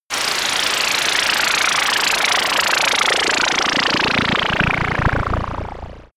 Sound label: spinning fire